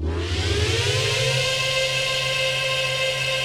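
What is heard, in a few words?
THX surround; Pro-Rec - Synthsonic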